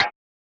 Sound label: Percussion, Hip Hop; Drums - One Shots; Cymatics - 9God Hip Hop Sample Pack